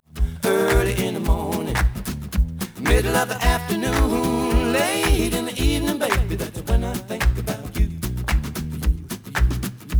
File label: noised; 3bit